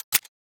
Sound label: Shotgun Dry Fire